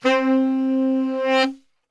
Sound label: C 2 SAX, Brass Super Section; Best Service